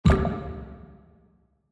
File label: Reel Stop; fire Wizard; Axial